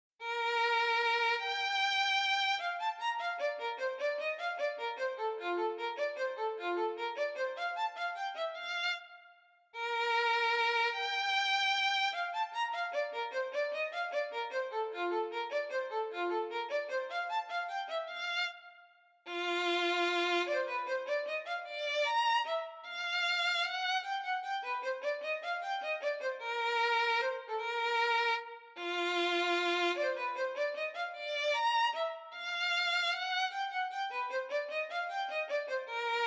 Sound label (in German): Menuett; Skizzenbuch pag. 61